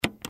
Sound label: button click release; svencoop; ui